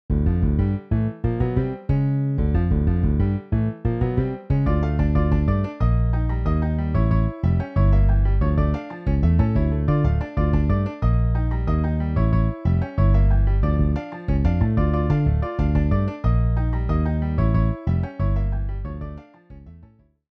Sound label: Piano Bass MIDI